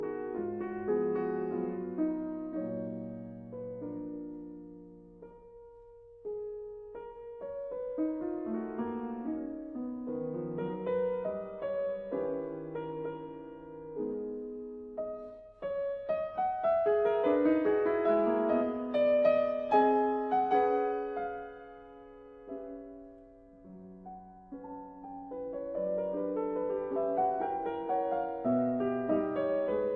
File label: Mazurkas in D sharp minor Doloroso; 10 Mazurkas Op 3 No 5